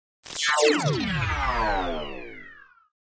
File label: Descend Electronic Descend with Shimmer, Splinter Effect